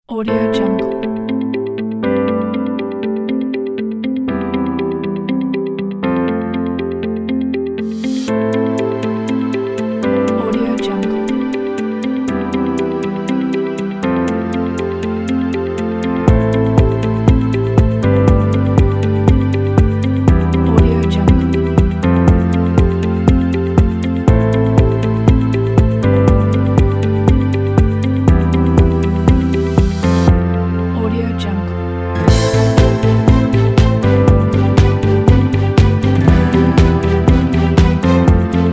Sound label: uplifting corporate background; bobi-music